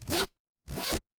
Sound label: paper fabric zipper